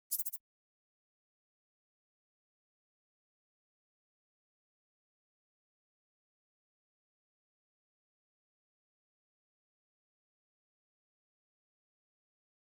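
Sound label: Staggered Drum Loop 141 BPM Percussion; Drum Loops; Loop Stems; Cymatics; Mystery Pack Vol 4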